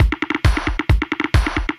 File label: Tech loop